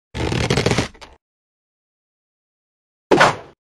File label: slingshot sound effect; angry birds